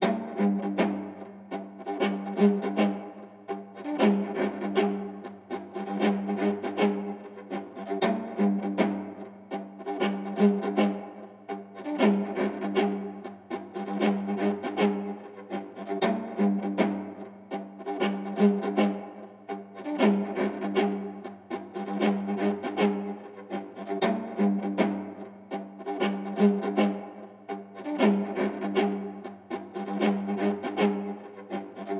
Cello; raji an ancient epic

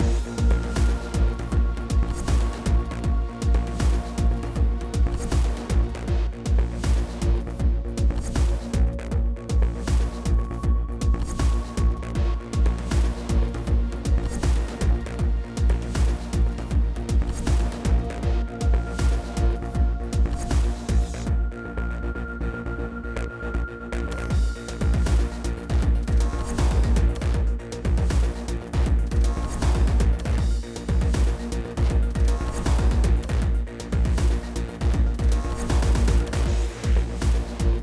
Combat 8CH 118 5BPM

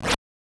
Uppercut Missed

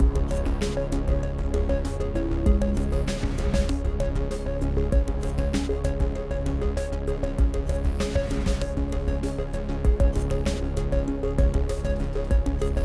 Exploration 8CH 97 5BPM

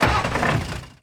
vehicle excavator ignition; teardown ps5 windows xbox series xs gamerip 2022

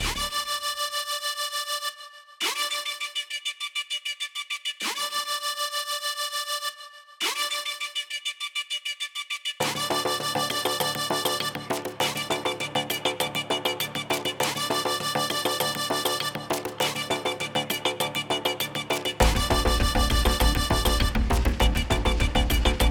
Dramatic Background Theme (Looped); Astro Flame: Starfighter; PS4, PS5, Switch, Xbox One, Xbox Series X|S

